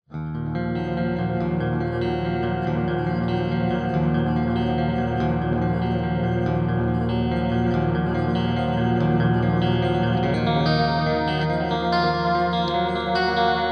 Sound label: dark echo zombie